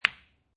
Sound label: Billiard Pool Snooker Ball Hit By Other Ball Hard; Sound Effects:Sports